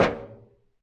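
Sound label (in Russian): стук короткий металлический осторожный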